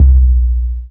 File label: DM BAS, Dance Mega Synth